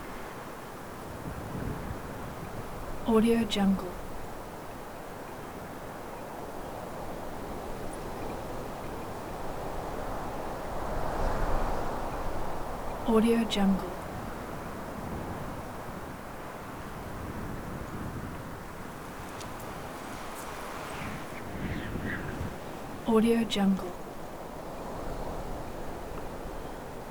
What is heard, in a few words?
desert wind thick constant gusts